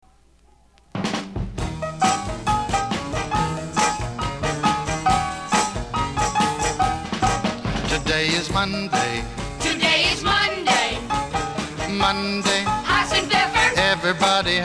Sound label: Camp Menu Song